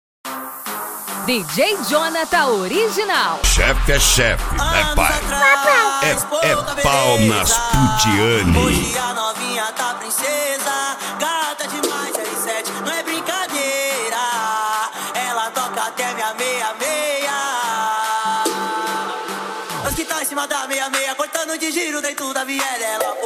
trap funk

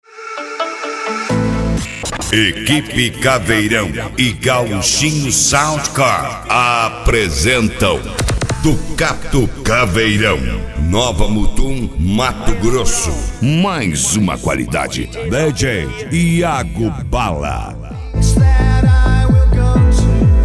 especial mega funk

